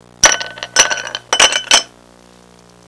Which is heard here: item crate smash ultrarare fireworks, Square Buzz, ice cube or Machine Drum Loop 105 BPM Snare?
ice cube